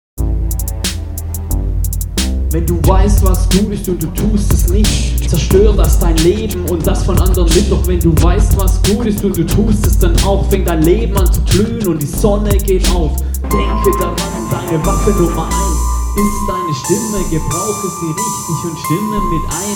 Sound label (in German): RAP